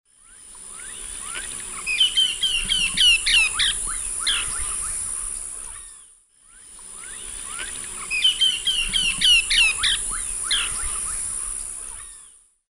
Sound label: Myiarchus ferox, alarm call; S. America; Eastern Lowlands